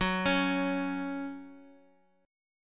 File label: F sharp 5th F sharp; arpeggiated; JGuitar